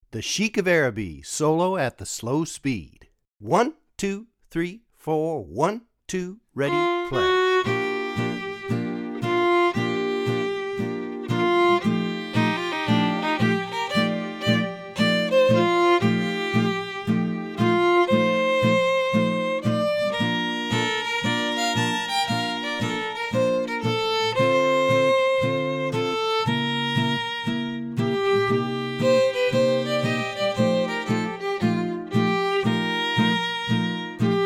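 Solo - Slow, Swing Jazz Violin with Hot-Club Rhythm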